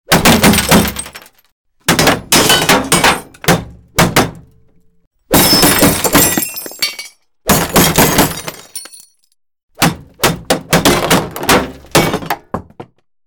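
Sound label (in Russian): удар металла разбитого стекла; udar chtobi udarit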